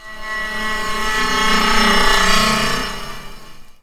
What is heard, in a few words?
waterphone